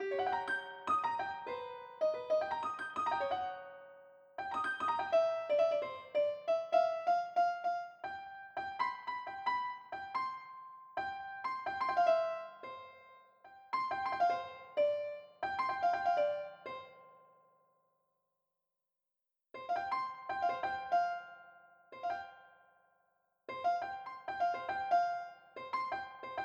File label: piano